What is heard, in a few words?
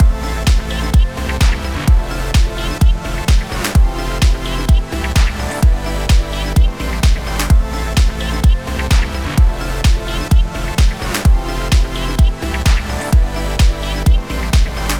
Music Loop